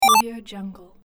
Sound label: retro 8bit video game pause sound; tony-parsons-music